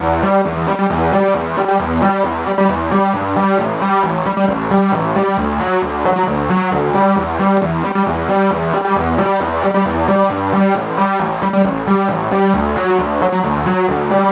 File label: 134 House Fat Synth Lead Em; Dance DJ; Pro Tools Essential Demos; Digidesign; Essential Loop Demos; Demo Session - Dance DJ